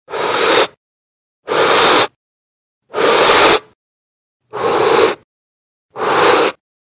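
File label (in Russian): звук вдыхания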